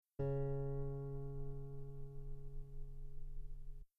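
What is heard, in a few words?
guitar C sharp 3 very long piano normal; bananenkeyboard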